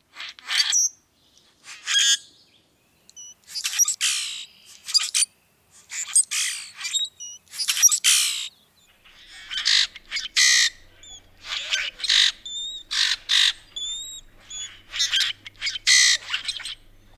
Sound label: Grackle Common